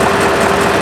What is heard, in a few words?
TRUCK; RallyC; CD-Gamer 2000-05